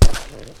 Step Squeaky

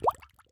Bubble

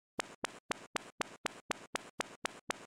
Walk; Mirror House; Cornflakes; N64

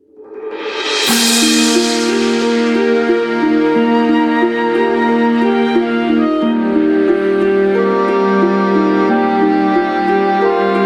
Bgm Fantasy; RPG Maker WITH

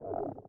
Hunger

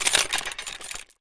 Chains

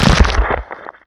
Twist

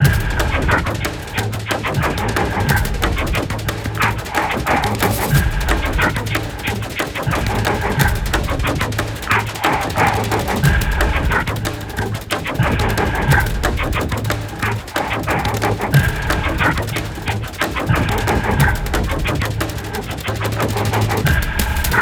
Percussion; beyond good evil; Xbox; Fear the Reaper

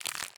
Eat

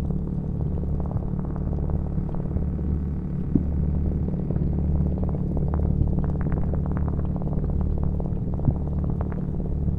Ambience Inside Vulcano

